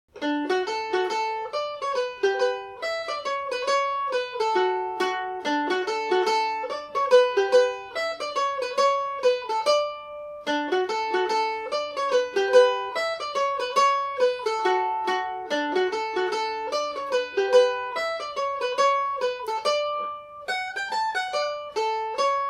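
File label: D major G and O, irish mandolin; kittys fancy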